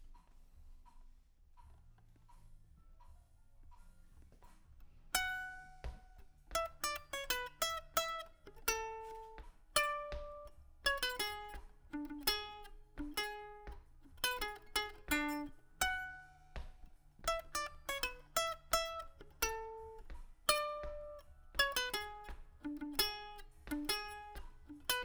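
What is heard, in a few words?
guitar Mic; GTR 1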